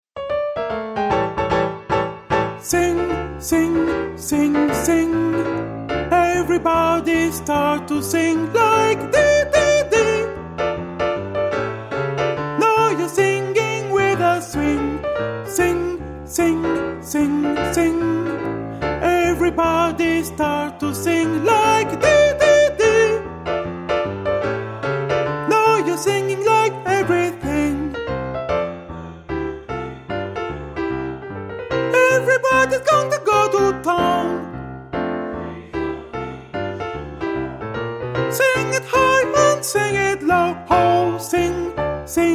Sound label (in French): LENT MEZZO